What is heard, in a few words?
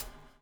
BUBL, BUBBLE; Spectrasonics - Backbeat